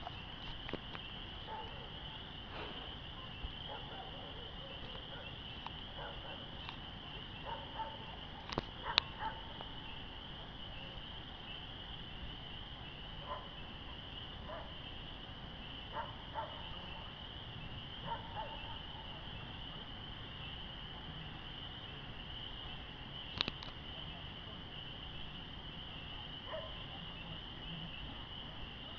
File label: Spring Peepers Dogs